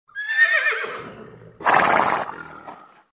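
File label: horse neighed and snorted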